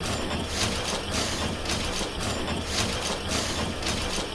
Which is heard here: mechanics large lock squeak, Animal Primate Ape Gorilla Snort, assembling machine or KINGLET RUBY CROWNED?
assembling machine